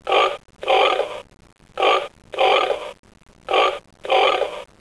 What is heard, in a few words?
Pig; BUDZIK METALOWY Z GLOSEM PROSIACZEK